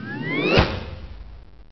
Backward Wok Hit; Cheesy Lo-Fi Sound Effects

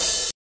hat; DRUMS (SINGLE HITS); Hats

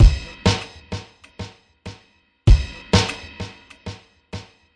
97 bpm, kick triplet snare echo

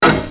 clonk, weird and interesting hits

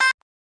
nasty stormfront; Nasty Stormfront Electro FX

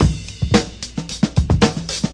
hardcore live, drum loops and breaks; 112 bpm

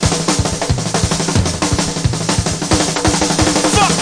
179 body count roll, drum loops and breaks; 161 to 180 bpm

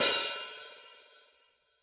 HH ACOUSTIC OPEN OUTER, Roland TD 7; drum machines

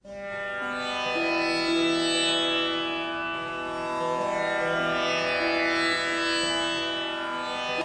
darbari kanada xfade, Far East Drones and Plucks

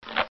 Fast Scrape; Cheesy Lo-Fi Sound Effects

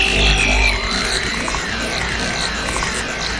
synth; MELODIC SAMPLES; SYNTH AND ELECTRONIC

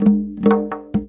arabic perc, Arabic Percussion Kit

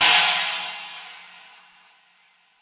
CHINESE, Roland TD 7; drum machines

